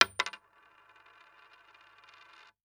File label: Impact Coin Quarter Dropping On Wood Table